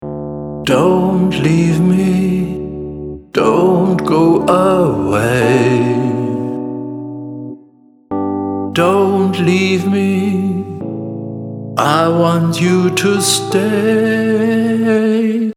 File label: flexpitch loud